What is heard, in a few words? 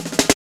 Fills; AMG; Now CD-ROM (Roland)